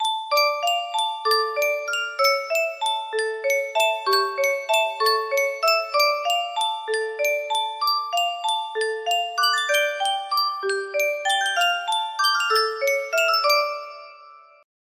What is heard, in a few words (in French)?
Yunsheng boite a musique